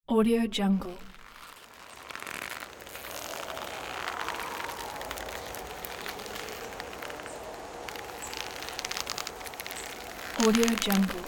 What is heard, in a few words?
rope bridge